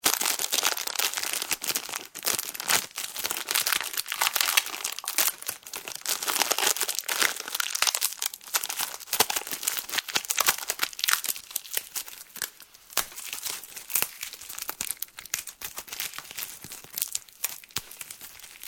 Plastic Wrapping, Sound of stretching and unwrapping Polythene.; Materials